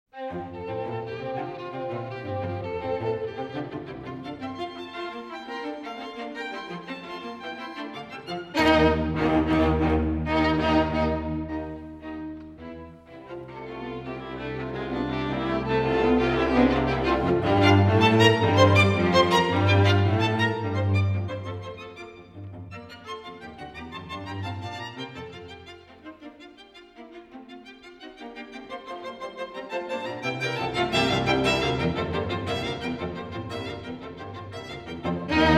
String Quartet in F Major, Vivace; String Quartet No. 16; Op. 135; Ludwig van Beethoven; Beethoven - String Quartets Nos. 1-16

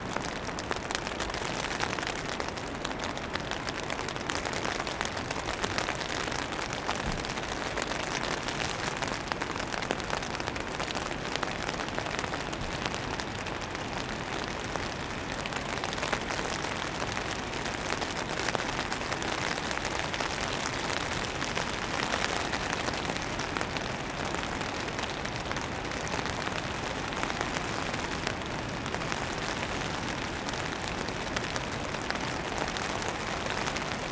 Medium Rain In A Tent, Field Recordings Oregon; Omni Gardens

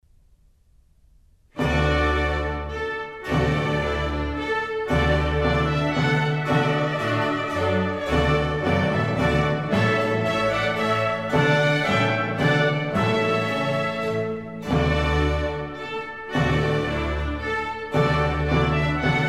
Music for the Royal Fireworks

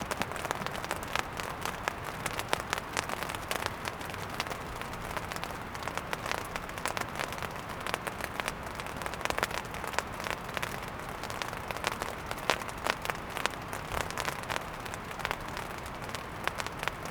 Light Rain In A Tent, Field Recordings Oregon; Omni Gardens

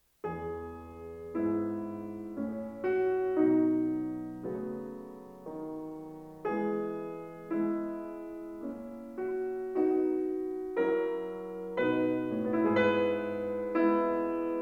Piano Sonata No. 30 in E major; Wilhelm Kempff; Beethoven; Piano Sonatas

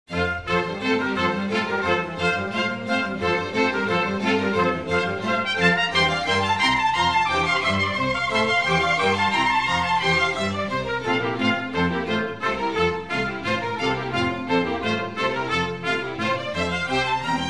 Concerto in F Major, Allegro; Concerto No 1; Bach; Bach The Complete Brandenburg Concertos

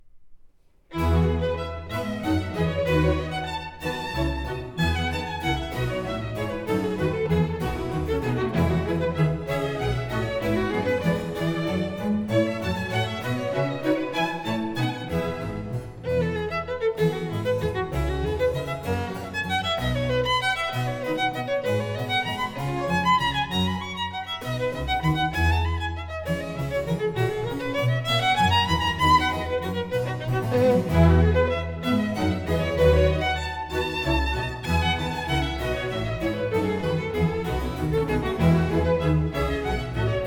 Violin Concerto No. 2 in E major

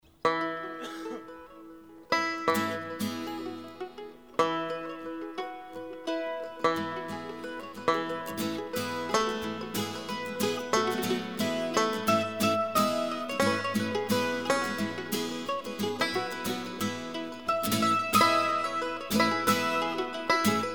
Instrumental

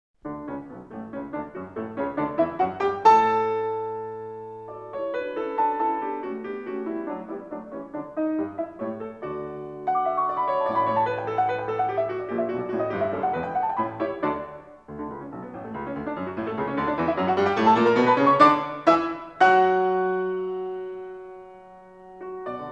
Piano Sonata No. 7 in D major; Wilhelm Kempff; Beethoven; Piano Sonatas

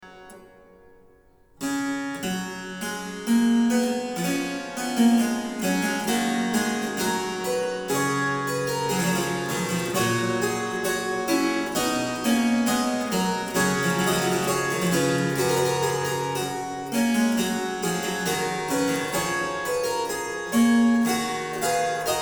Quodlibet, Harpsichord; Bach, J S; Goldberg Variations; BWV 988

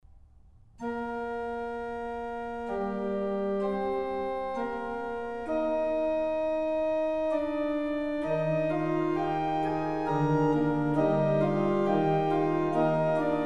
Prelude and Fugue in E flat major; Bach

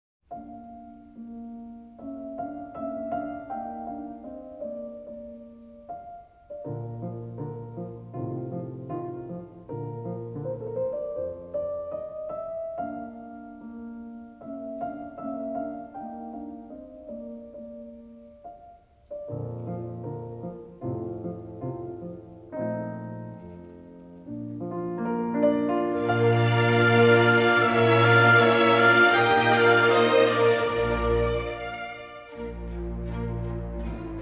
Piano Concerto No 20 in D minor; Wolfgang Amadeus Mozart; Piano Concertos Nos 20 & 24

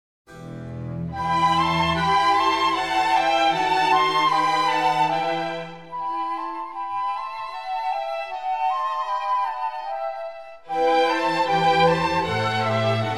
Concerto in G Major, Andante; Concerto No 4; BWV1049; Bach; Bach The Complete Brandenburg Concertos

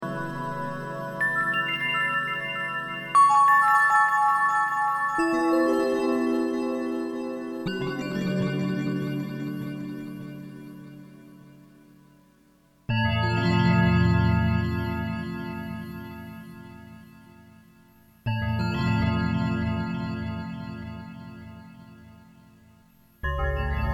Final Bell Threshold; Pershore

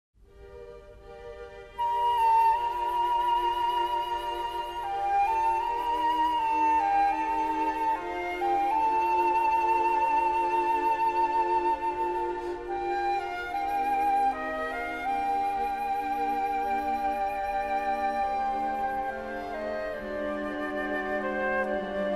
Nocturne for piano No. 2 in E fl

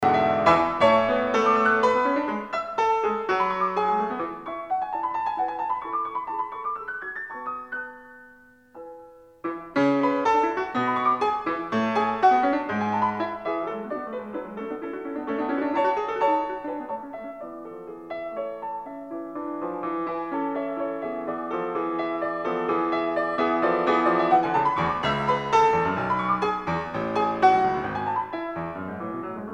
Piano Sonata No. 28 in A major; Wilhelm Kempff; Beethoven; Piano Sonatas

